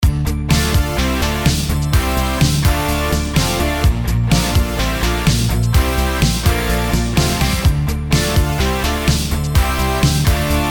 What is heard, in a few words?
Avenger Synthwave; Born in the 80s